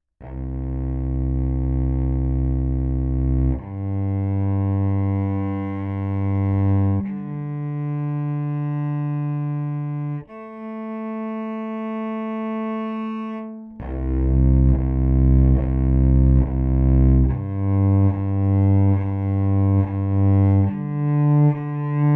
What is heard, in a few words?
Cello Pickup Baggs Bass